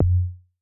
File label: B2 Pure Tuned Kick, Tuned Sub Kicks; One Shots; Touch Loops; ElementsOne; Abstract Rhythms